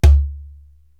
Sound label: Djembe-Open; Korg TR-Rack